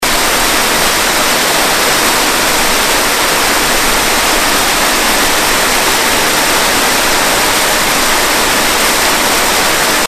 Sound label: Indian Well Falls